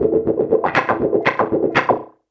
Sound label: 120 WAH WAH, Electric Guitar Loops; USB Soundscan vol.04 - Electric & Acoustic Guitar Loops; AKAI